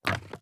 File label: Drinking Fountain; gamerip